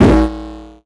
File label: Multimedia Internet CD-Rom Flash Buttons Hard